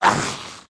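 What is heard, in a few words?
mutroop damage up, monster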